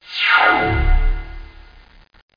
END TURN; CD Action; mtg; DuelSounds

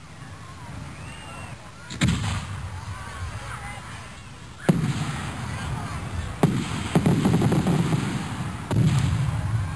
Fireworks with Crowd

